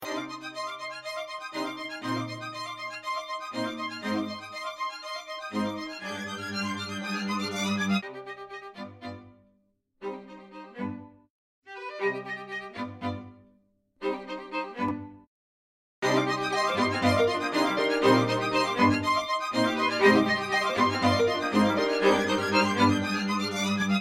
Orchestral

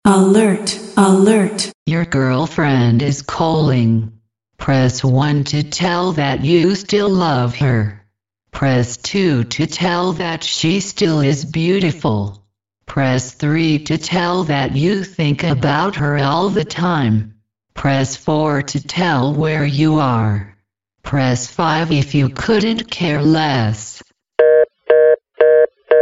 new girlfriend alert

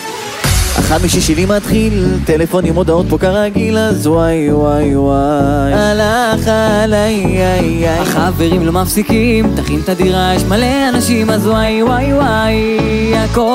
Is Calling Rap, ringtones

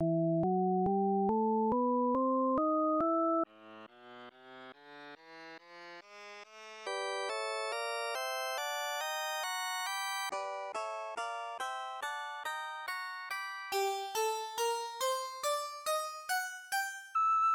F Flat Harmonic scal

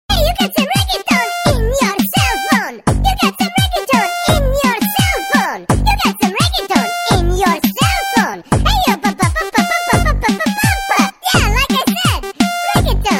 baby msg tone

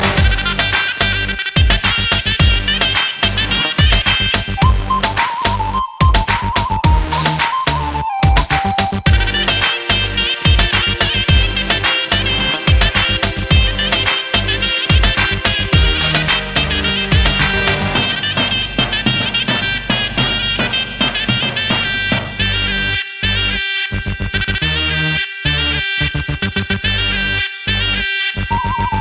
Armenian Love

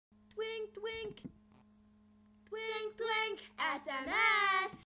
Chorus

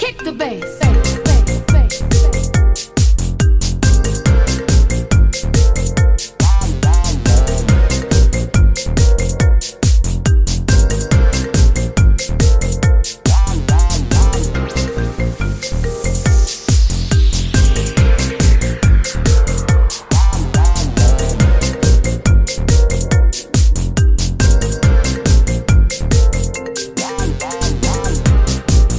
iPhone Soulful Remix

